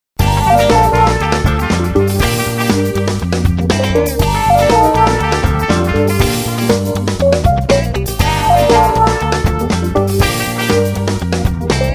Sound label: dub